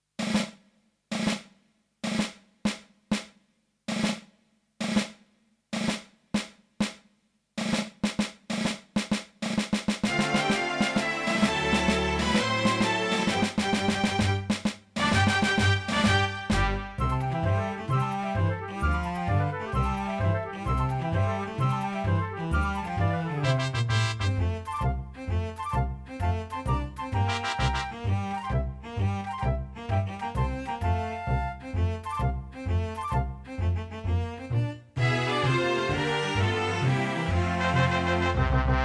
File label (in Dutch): karaoke